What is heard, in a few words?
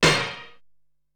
Big Blast, Ensoniq SQ-R Plus